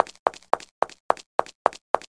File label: foot step